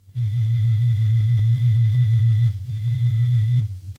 office phone vibrate

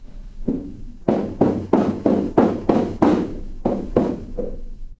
footsteps stairs

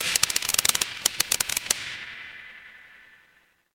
fire electric arc

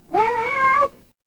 animal cat meow

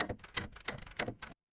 mechanical machine

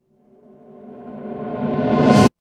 transition riser

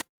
ui hover

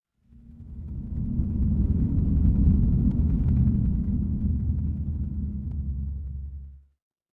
horror ambience dark

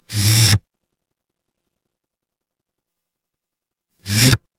fire electric zap

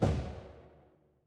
transition braam

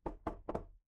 door knock

